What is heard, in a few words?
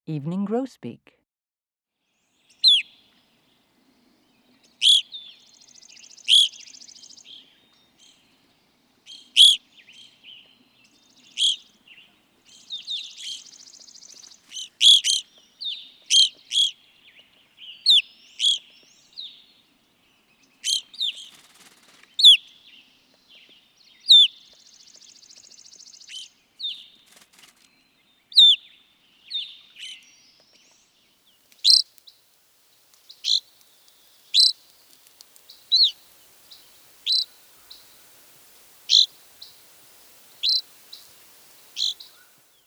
evening grosbeak